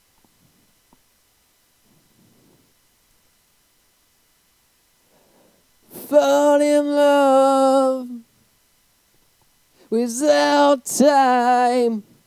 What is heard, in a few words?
bridge echo